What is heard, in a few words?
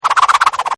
round score count healing